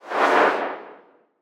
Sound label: Wobly Whoosh; Whooshes